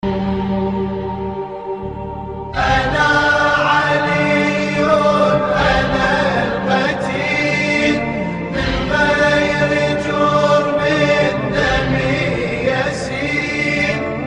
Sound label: Nauha Farsi; Danish